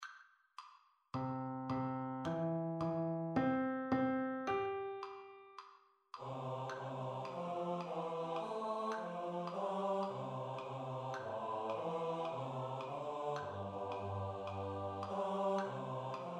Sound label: BASS Study